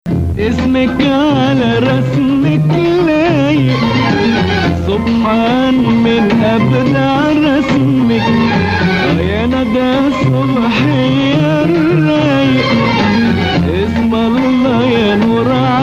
Nahawand

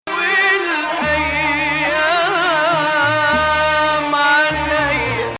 Ajam; Ajam, which resembles the Western Major scale

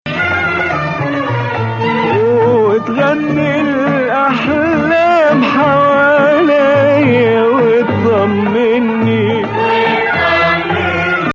Nawa Hijaz; Maqam Nawa Athar; Hijaz 5

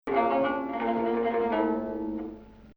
Hijaz

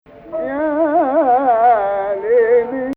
Rast Fein Ya Gameel 2nd Rast

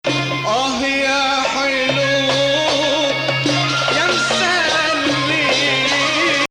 Bayati Ajam; starts on 6, although admittedly tonicization not strong

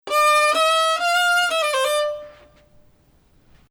Bayati Octave; Bayati 8

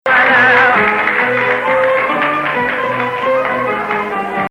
Hijaz

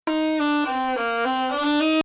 Bayati Samai Ajam 6 low; Samai Bayati il-3ariyan

